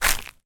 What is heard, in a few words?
foley footstep gravel dirt dried leaves